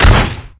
Vehicles Hoverboard

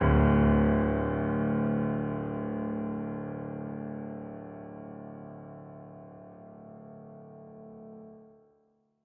Piano

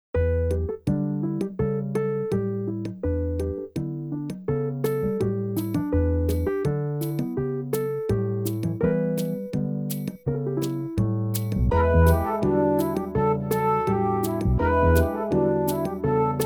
Creature Attack; Pro Pinball Big Race USA

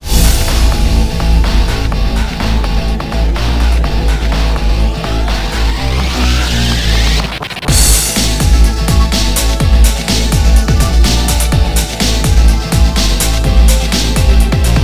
menu music; Datamine; dsiware

